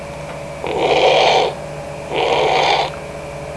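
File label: blown nose; People; Sound Library 2000; Wayzata Technology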